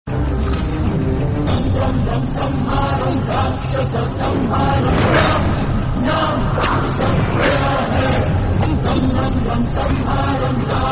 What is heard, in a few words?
Fight BGM; Dookudu